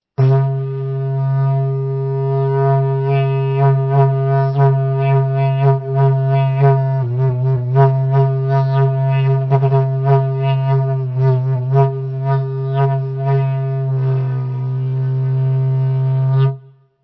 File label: didj